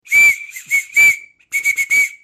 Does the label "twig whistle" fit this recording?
Yes